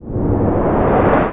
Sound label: skill charge; SkillSound